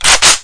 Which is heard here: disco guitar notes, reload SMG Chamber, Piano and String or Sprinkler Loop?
reload SMG Chamber